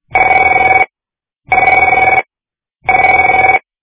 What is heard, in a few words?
phone